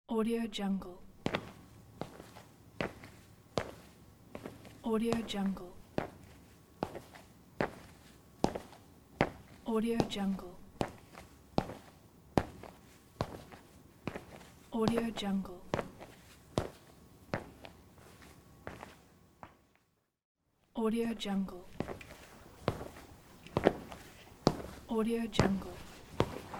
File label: footsteps male sneakers on tiles; قدم زدن با کفش کتانی; human sounds